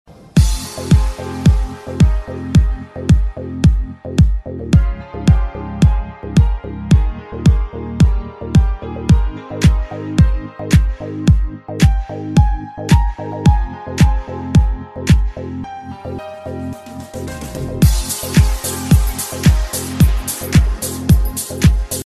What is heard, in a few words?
rain bass ringtone